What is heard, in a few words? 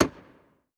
Stage Stamp 2 Rimshot, Drum Hits; Aberdeen Music Hall; Music Hall Percussion